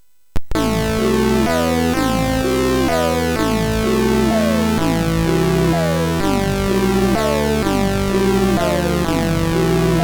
Zap, MOS6581R3; hvsc

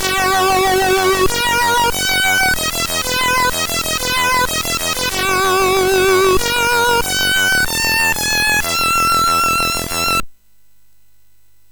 Jingles; hvsc

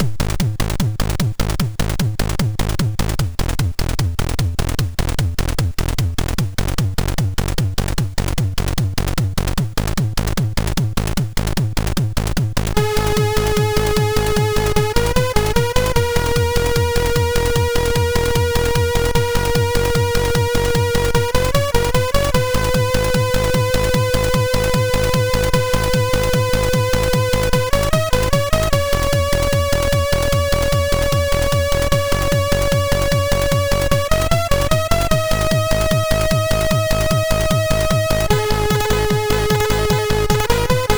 Offbeat Loop, MOS6581R3, SIDwave

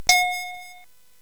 SFX; Narco Police; hvsc

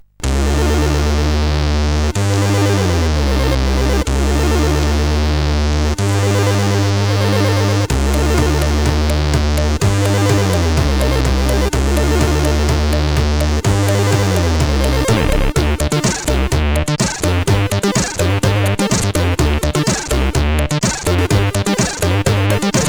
Funky Stuff, CSG8580R5

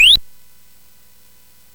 Ducks Ahoy, CSG8580R5

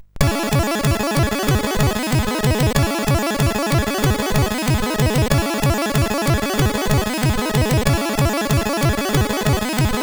Hardcore Tekkno, CSG8580R5

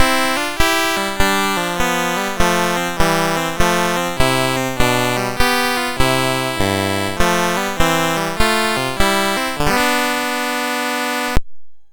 Duet, MOS6581R2

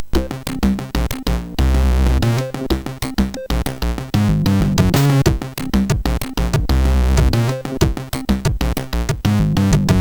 Farting, sid MOS6581R4; hvsc

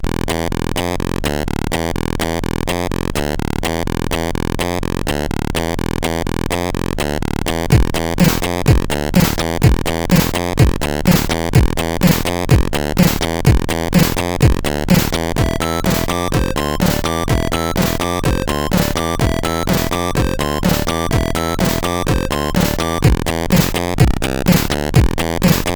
Hard Techno sid CSG8580R5; X-Radical

